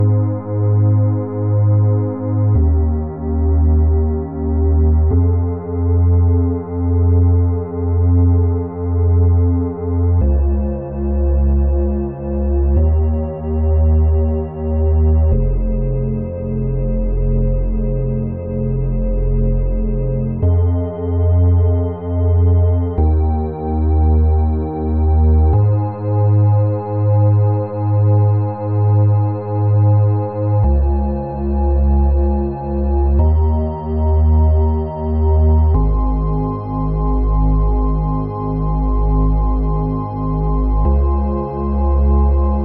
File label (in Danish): Orgel